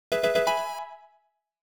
Fanfare - Item Obtained; Pokémon FireRed and LeafGreen Remastered

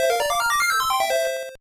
Unknown Jingle; Sonic Advance 2

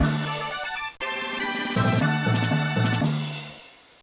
1-Up; Sonic Advance 3

